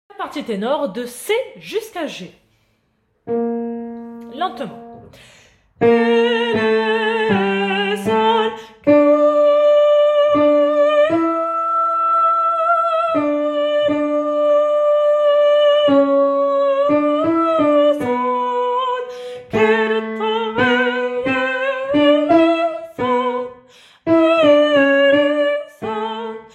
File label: Kyrie TENOR